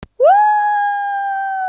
woooooou

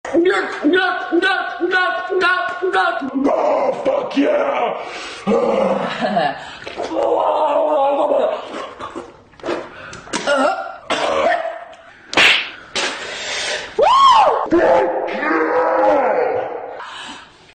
raaaa

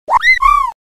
sound effects chocobo wark; final fantasy vii; FFVII Chokobo Wark

